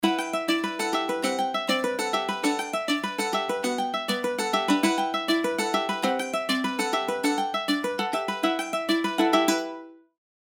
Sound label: delay